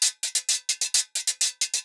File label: Guira 130